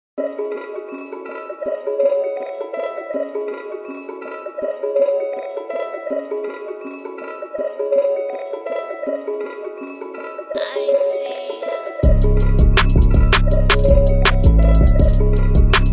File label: instrumental